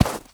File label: horse footstep snow hard; Rust